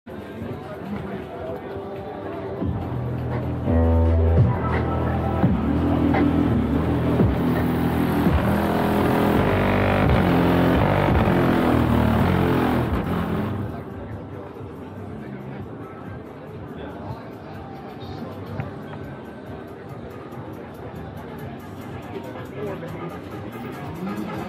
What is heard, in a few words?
Car Showroom; نمایشگاه ماشین